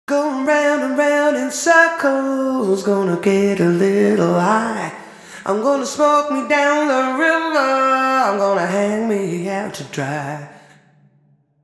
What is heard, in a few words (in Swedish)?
vocal ir trapphus handklapp ortf; Handklapp, trapphuset i Kopparhammaren 2 på sång, HT2016 - handklapp